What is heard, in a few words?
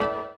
orient, bizarre guitar; Spectrasonics